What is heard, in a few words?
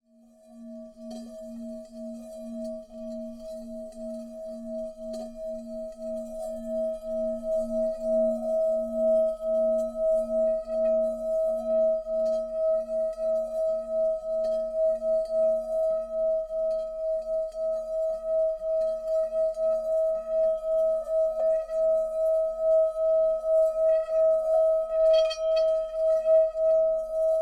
Large Brass, himalayan bowls